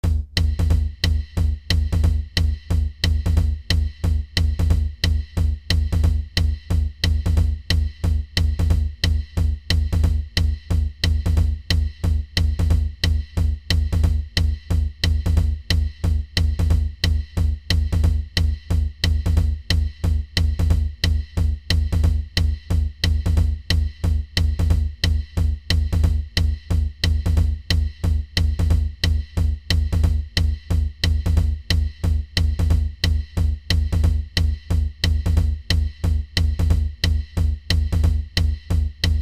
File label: bodhran reel, irish